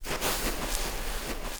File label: Brutal walk